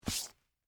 Combat Boots Scrape, Footsteps, Combat, Boots, Scrape, Hard, Wood, Surface